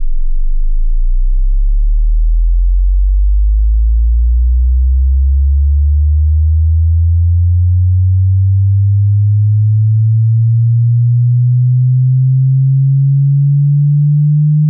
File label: bass sweep 20 200hz